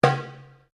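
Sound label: Drum Darabuka Edge Slap mezzo forte, Egyptian Darabuka Drum; Commanly available Egyptian Darabuka Drum; Musical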